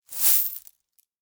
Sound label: Lift Coin Bag, Money; The Sound Pack Tree